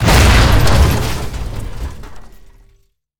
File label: Wings Damage; DCS